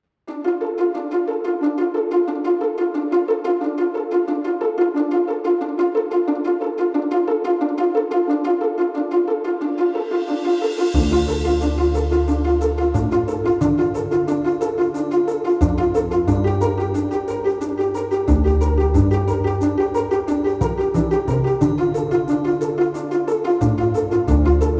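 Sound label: cheerful entertainment no piano pizz; by burak sound bank; cheerful vol 4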